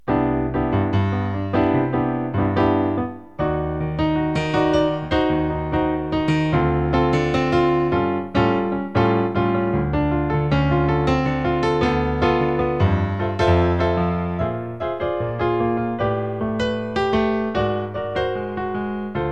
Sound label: bossa